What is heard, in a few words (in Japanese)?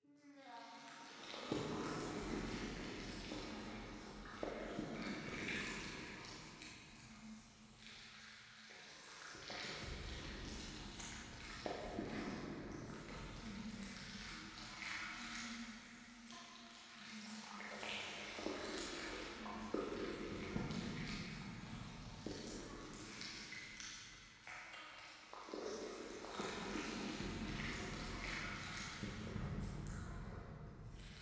教会 フェラチオ 触手